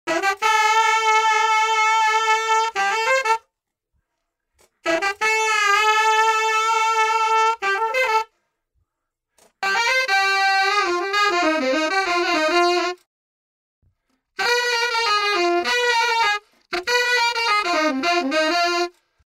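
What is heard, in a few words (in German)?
Saxophon, Mit Reel ADT; Waves Abbey Road Studios Reel ADT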